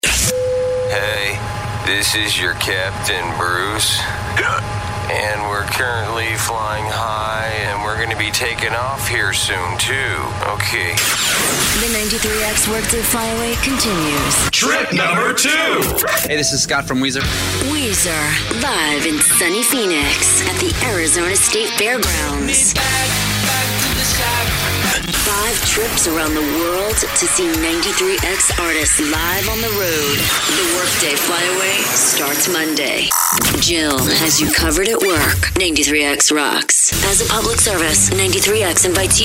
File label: Active Rock